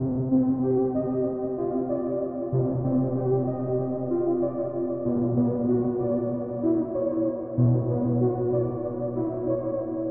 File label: Bittersweet Arp